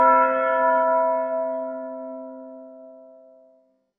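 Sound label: bell; chas kazni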